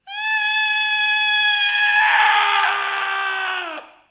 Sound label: A Young Man Screaming